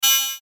Beep Mechanical Buzz Short; Production Element Imaging Element Accent Transition; Multimedia; Beeps

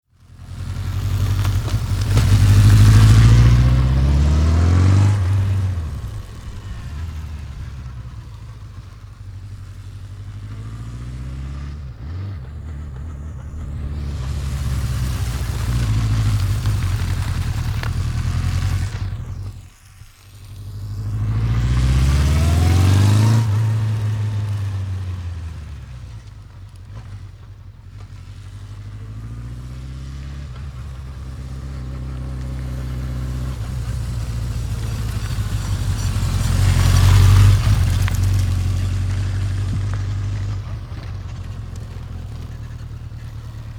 Motorbike Suzuki 750 Cruising Around; Up and past several times then up and stop; Motorcycle; Tom Hutchings

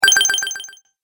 Super Bright Collect, video game

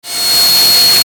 Static Electric Sweep, Static Oscillation Fuzz; Production Element Imaging Element Accent Transition; Blastwave; Static Sound Effects

